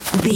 footstep snow walk

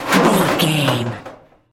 metal sheet impacts

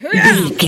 voice female attack

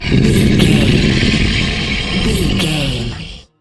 troll monster growl

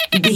beep funny scanning targeting

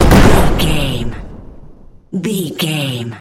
explosion large

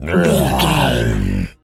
zombie voice grunt

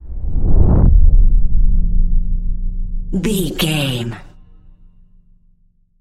cinematic deep bass pass whoosh

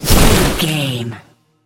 nature spell vines blast impact

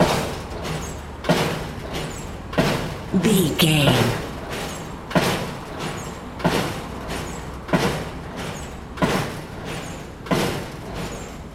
background construction industrial loop

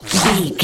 bullet fly by slow

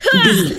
voice female attack

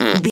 fart squirt

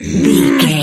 voice female growl

